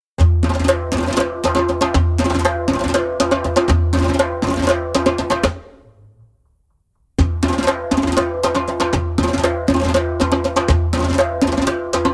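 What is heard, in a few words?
Turns in Seven (fast) spoken, djembe; Djembolale - Fills and Drills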